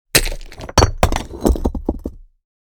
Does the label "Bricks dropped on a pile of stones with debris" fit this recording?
Yes